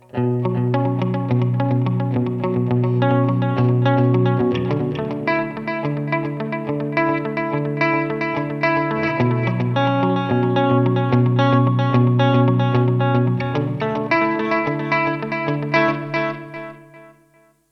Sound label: Boss RV-200 Delay